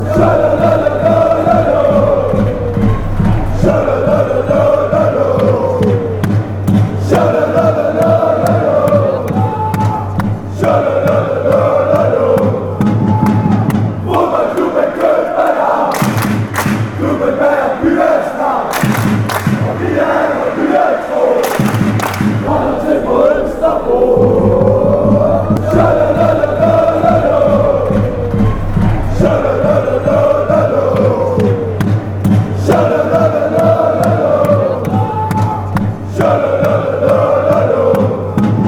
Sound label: chant; fc copenhagen